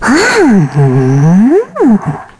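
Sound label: Vox Hum, Korean; voices; heroes; Nia; kingsraid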